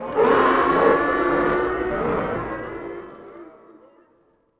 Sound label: walla cheer; tusken; Star Wars Obi-Wan; XBOX